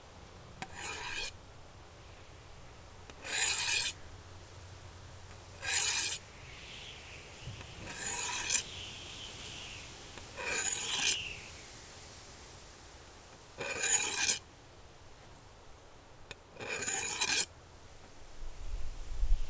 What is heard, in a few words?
Blade sharpening